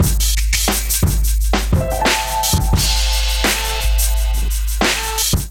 Kit groove 87 bpm, Drums; MIDI Clips; Bang Ya Head; Ableton; Chop and Swing

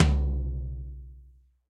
Tom Floor Old School, Drum Hits; Standard Hits; Ableton; Factory Packs; Session Drums Studio

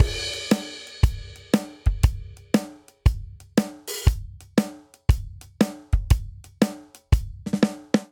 Blues Shuffle Verse Variant 118 bpm; 4 4; MIDI Clips; Ableton; Factory Packs; Drum Booth

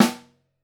SN1 Overhead, Snare; Ableton Foundation FreePacks; Snare Designer

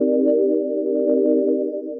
Bounce Chords, Synth; Synth Misc; Ableton; Factory Packs; Beat Tools

